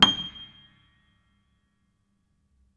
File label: Note High, Piano; Piano Miscellaneous